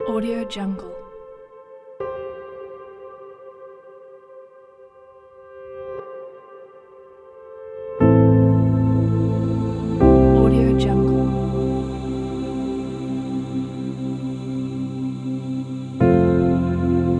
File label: ambient story pack